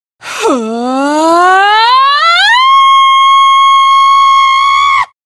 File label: pain; queen of pain scream